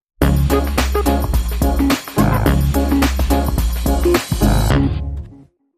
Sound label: Bleeps And Blops, Sting